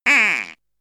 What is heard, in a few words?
quack duck, comedy cartoon vocals; soundpool